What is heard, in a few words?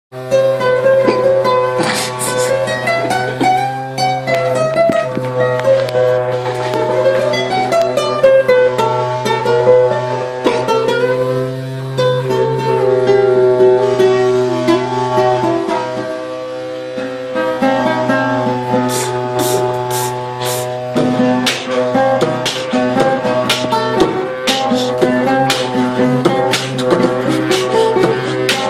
Slavic sink music